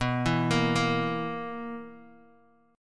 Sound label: B Major 7th Suspended 4th, B, down, Guitar Open D